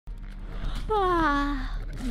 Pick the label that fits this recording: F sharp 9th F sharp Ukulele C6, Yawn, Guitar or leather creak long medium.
Yawn